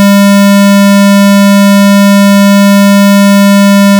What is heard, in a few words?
200 Square